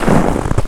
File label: STEPS Snow, Walk; High Quality Footsteps